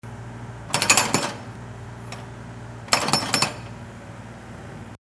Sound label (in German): Presslufthammer